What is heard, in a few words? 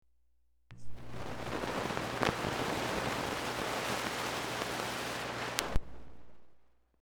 Static, Tube Amp On And Off; Tube Pa Amplifier Turned On And Off , Static; ca1960s; Noise; Electronics